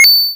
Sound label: 8 BIT RETRO Coin Collect Two Note Bright Twinkle mono; Slette Mette; android ios macos windows gamerip 2021